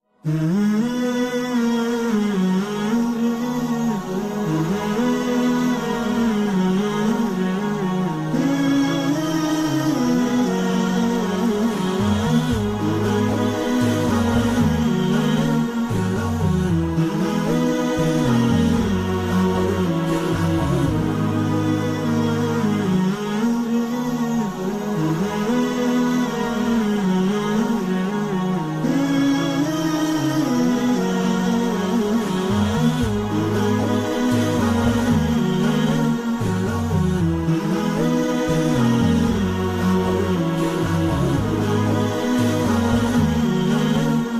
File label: Islamic Background